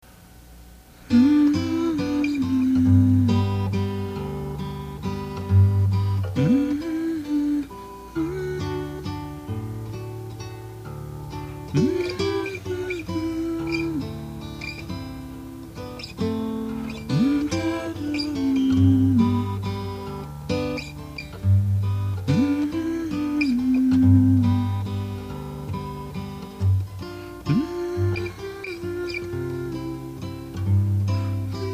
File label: spanish waltz